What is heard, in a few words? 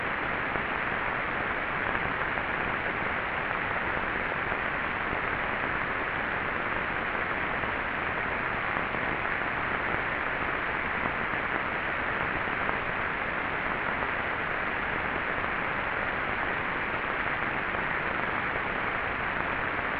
underlubricated